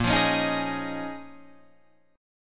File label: A sharp Minor 11th, Guitar, Open F; A#m11; strummed; 10,12,13,10,11,10